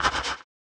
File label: Snare, One Shots, Drums; Touch Loops; BlissedOutBeats